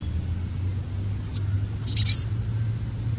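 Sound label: chickadee, birds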